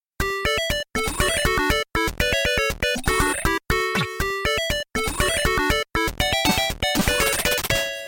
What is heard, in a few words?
Tetris Tune